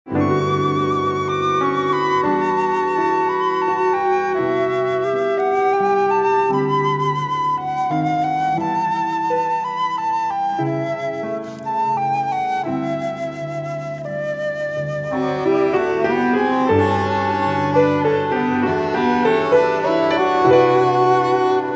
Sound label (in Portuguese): valsa